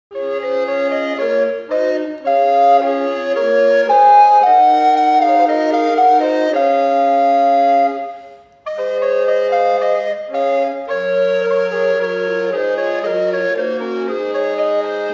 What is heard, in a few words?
Basse Danse